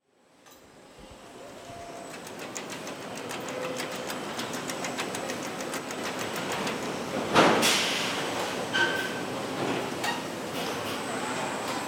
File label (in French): Usine Renault; PAYSAGE SO NORD DOUAI